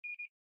camera focus, ui; Mobicel; Onyx

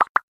Cartoon; Spark Go 2023

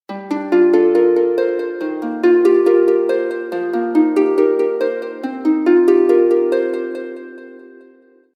Harp; Itel; Power-80

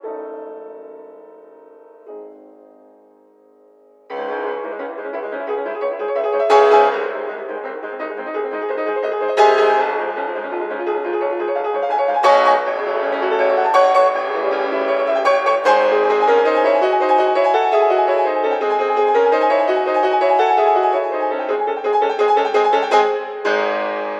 Presto, ringtones; Google Pixel 4; Classical Harmonies

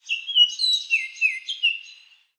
Chirm, notifications; Wiko; Cing Peax

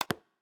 basic lock, ui; Stylo 4 Plus; LG

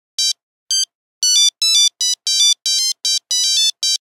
8310 Jumping, Nokia Buzzer; Windows Phone apps

